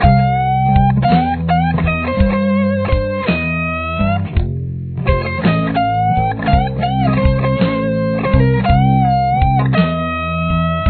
solo main, guitar

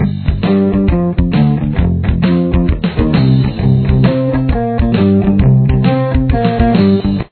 intro backing, guitar

